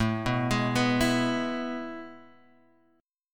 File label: A Major 7th Suspended 2nd, Guitar, Open G